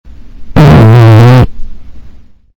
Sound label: fart